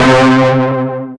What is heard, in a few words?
STAB